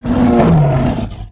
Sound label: Pet Dragon Speak; creatures pets